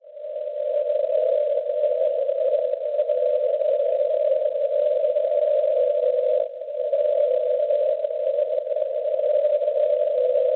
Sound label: NDB 336 kHz; LT; Halmstad; Svezia; Schwarzsee